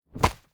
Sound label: monk strike; Shelter